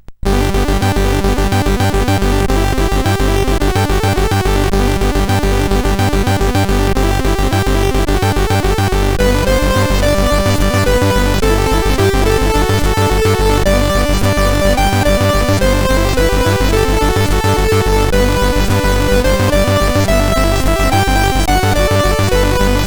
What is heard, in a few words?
Minimal, SID CSG8580R5